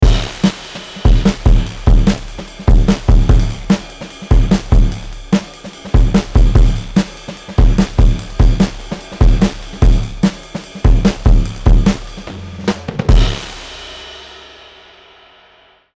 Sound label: Chandler Drums Drive; Waves